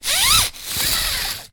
dog leash lead small extendable retractable short pull then retract; animals